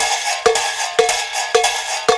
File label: Scraper, Percussion Loops; Ambient Grooves